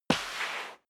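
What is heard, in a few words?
step sneaker concrete slide long, footsteps; schritte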